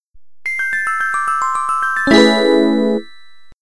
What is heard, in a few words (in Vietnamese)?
Nhac Chuong Tin Nhan Samsung Alert